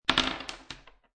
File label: Dice Throw Two Dice On Wood Table; Casinos